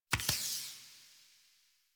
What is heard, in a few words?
Tarot UI Card Slide In C; Warframe; Harrow; Tarot Emotion Choice